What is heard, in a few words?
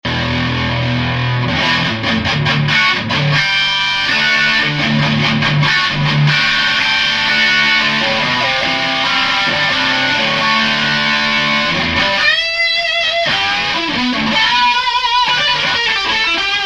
amp, pedals